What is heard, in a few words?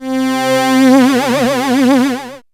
syn c, Dance Synths, Juno; Juno Jam